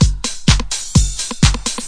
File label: house on the rim, drum loops and breaks